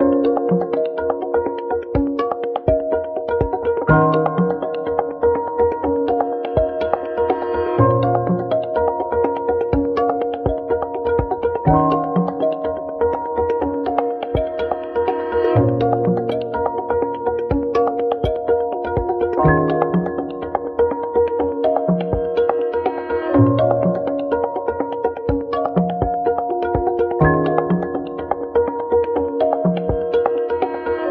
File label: piano, Sad Loops